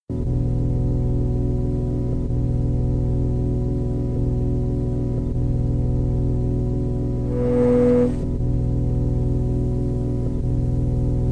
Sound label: Analogische Memories (Piano)